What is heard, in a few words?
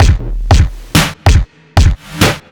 Noise Riddim, Drum Loops